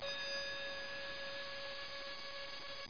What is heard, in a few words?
BELLS; Image Bonanza; Expert Software